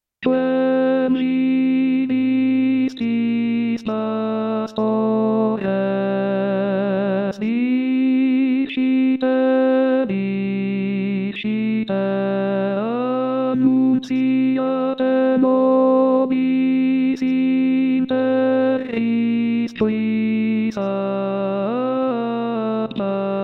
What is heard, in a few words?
Quem Vidistis Pastores Tenori; natale